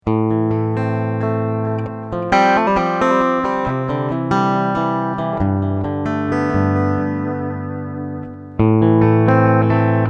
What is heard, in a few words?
MOOER Clean CAB SIM